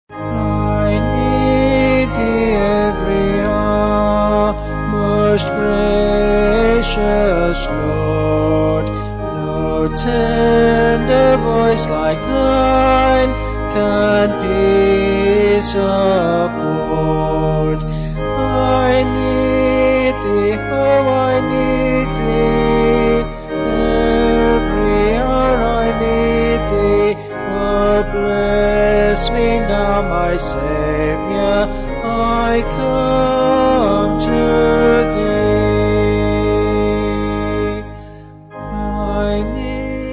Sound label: I Need Thee Every Hour Vocals Organ; 4/Ab; Sung Lyrics